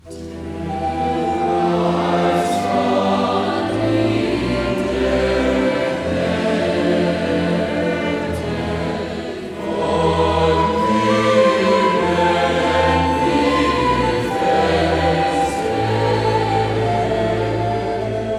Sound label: Chamber Orchestra; Michael Driscoll director; Komm du süße Todesstund